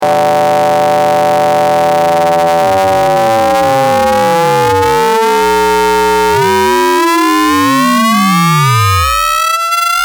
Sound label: Internal Osc X Square Sweep, ring modulator; analog synth